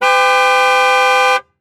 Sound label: Transportation Car Monte Carlo Exterior Horn Beep Long